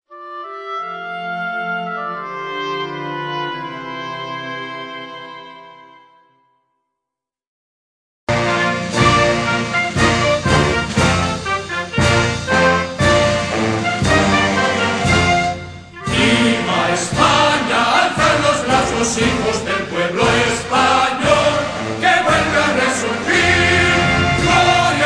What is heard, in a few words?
Spain National Anthem and Lyrics; ispanya vokal milli marsi